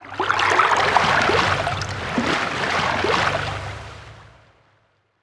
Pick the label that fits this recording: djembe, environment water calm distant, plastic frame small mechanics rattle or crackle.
environment water calm distant